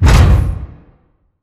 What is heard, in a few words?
pod door sm verb open stop; Titanfall 2